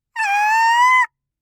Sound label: kyaa; gaya